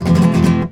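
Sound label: RASGAND F# major, FLAMENCO Guitars; Spectrasonics; Hans Zimmer Guitars VOL-2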